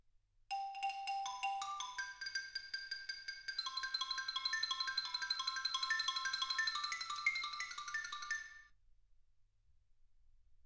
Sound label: xylophone